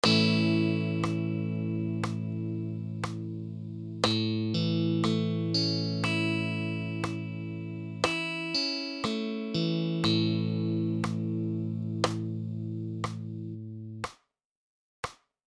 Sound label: A rhythm